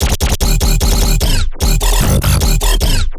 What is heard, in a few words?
Bass Loop D#, Dubstep, 150bpm; Quirky; Ghosthack; Dubstep Sound Arsenal